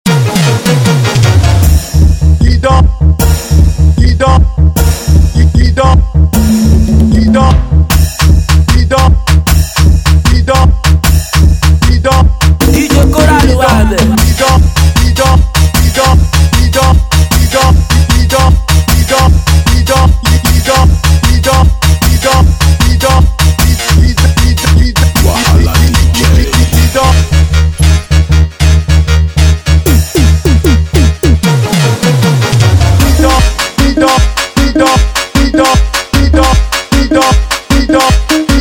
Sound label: Free Beat